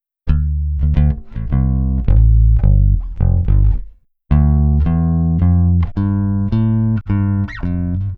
Jazz Bass, African; Sonic Foundry (Sony Creative Software); Continental Drift Aftershocks

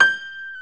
PNO, Grand Pno, Roland

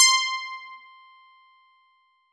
C5, PIANO; AKAI S-Series CD-ROM Sound Library VOL-8

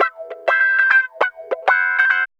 Guitar Licks; Sonic Foundry (Sony Creative Software); Ambient Grooves; Guitars

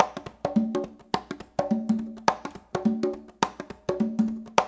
LOOP, CONGAS, Percussion

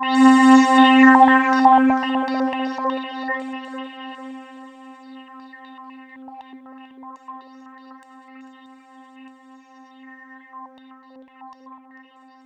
C3, SynthLine

